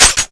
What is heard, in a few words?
shoot end, weapons; halo gun